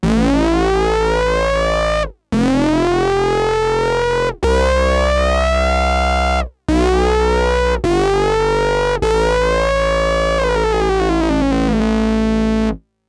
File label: mopho sync; davesmith mopho